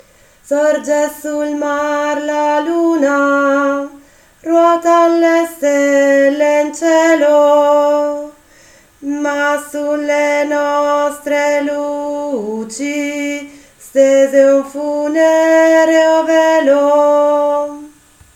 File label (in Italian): contralti